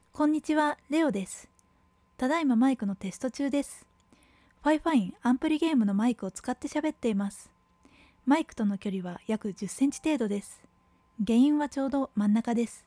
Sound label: amp